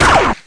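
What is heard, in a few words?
laser shot; Total Chaos Nukem 3d Pack; duke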